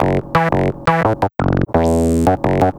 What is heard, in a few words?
Squibble, Bass